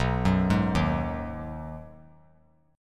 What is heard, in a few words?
B 6th B down 3C 3F 3A 3D, chord; arpeggiated